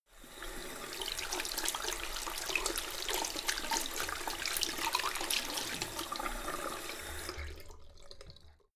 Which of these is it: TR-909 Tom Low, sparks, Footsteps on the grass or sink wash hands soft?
sink wash hands soft